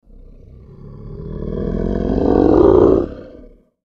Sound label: Monster Snarl Slow Mean Growl Creature; Horror; Animal; Monster Sound Effects